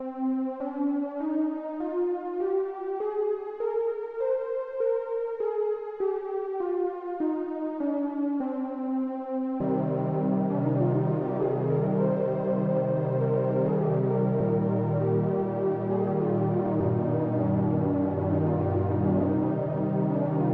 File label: 16EDO Mavila7 Studies Anti Ionian